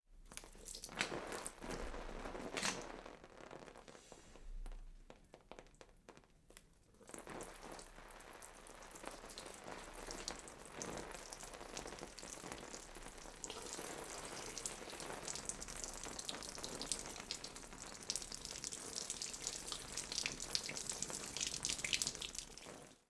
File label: water spray on body steady stream